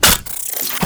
Pistol Reload; weapons; Warframe; Grineer; Orokin

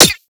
Rifle Mech; Weapons; Warframe; Corpus; Ruban

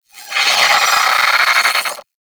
Sentient Brachiolyst Attack; Warframe

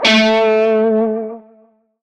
Shawzin Flourish Oct Two A; Music; Warframe; Narmer Shawzin

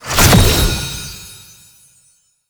Brimstone Rune Pick Up; Roathe; Warframe; Tau; Abilities

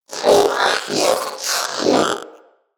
Companion Vocal Breath Run, Pets; Zanuka

